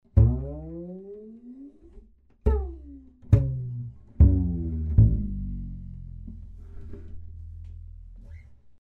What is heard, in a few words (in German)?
Gezupfter Bass